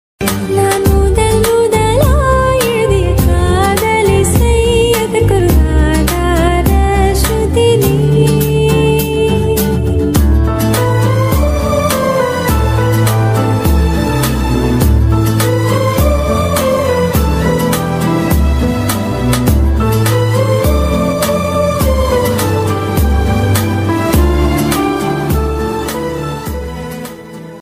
bgm

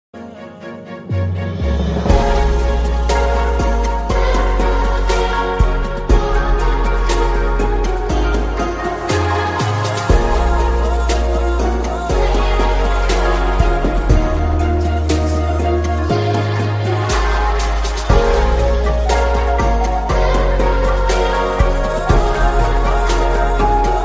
cover version ringtone

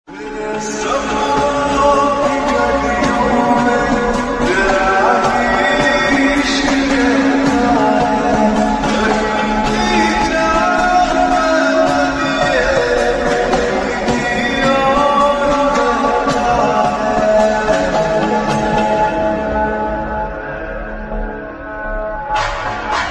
lofi ringtone love ringtone

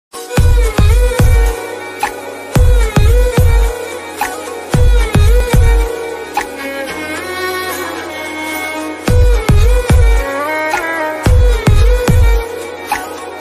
heart beats remix bgm ringtone

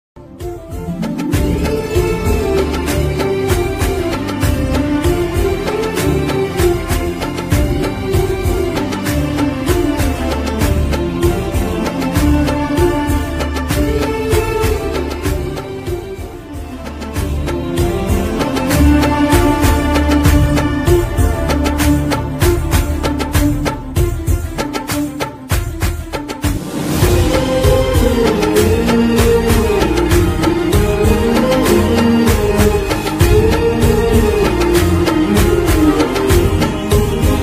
climax bgm; attarintiki daredhi; telugu ringtone

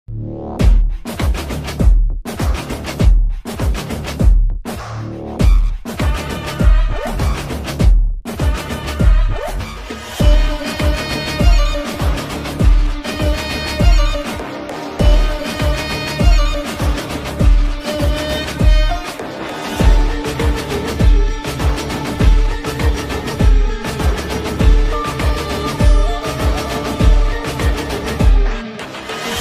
villain bgm ringtone; saripodhaa sanivaaram; sj surya

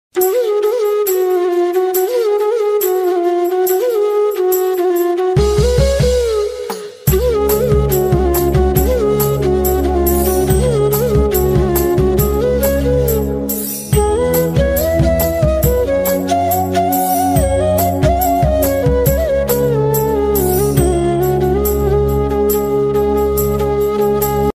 Lord Vinayagar song bgm tamil ringtone

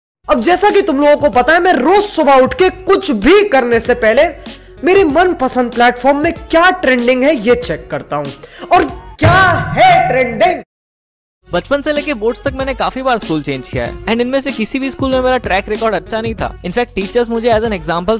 background music

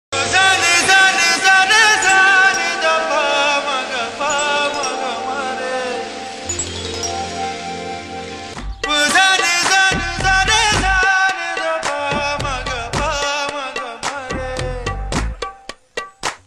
magical voice and bgm; bombay movie; tamil

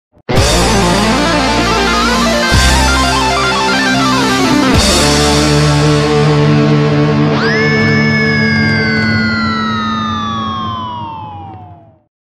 electric guitar solo ringtone guitar

